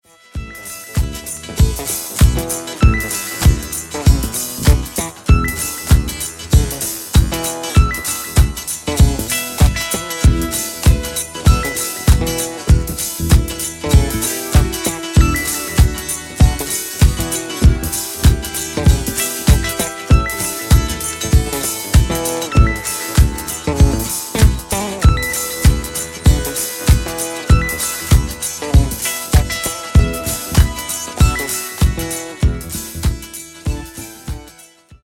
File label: Funky Bass